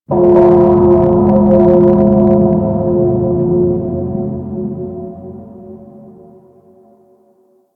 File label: Primordial Bell; Ambience